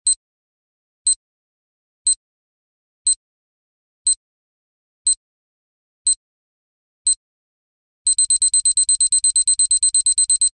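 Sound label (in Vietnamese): Tiếng bom hẹn giờ đếm ngược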